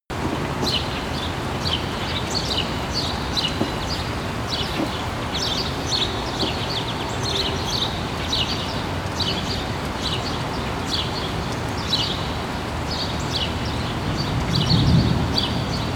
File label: thunderstorm